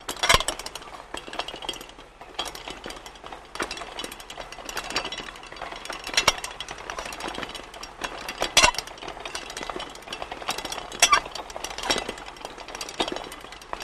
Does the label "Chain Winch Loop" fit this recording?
Yes